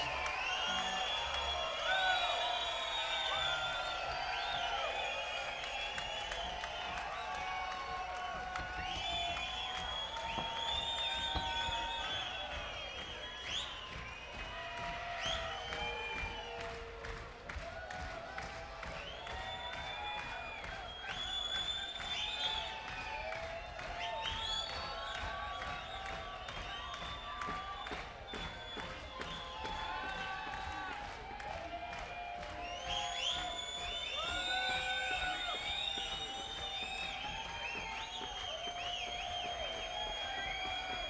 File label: Crowd; Boulder, CO; Boulder Theater